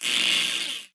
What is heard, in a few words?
bullet energy titan shield spang cluster